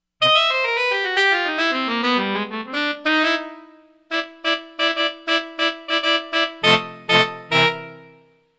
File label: Ethno Sax, Korg MSC-13S Ethnic 2